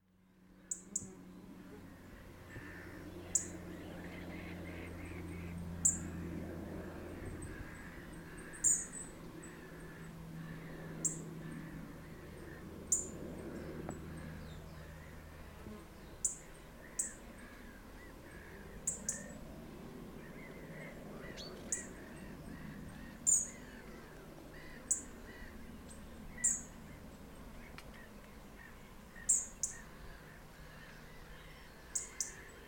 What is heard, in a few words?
European Robin Erithacus rubecula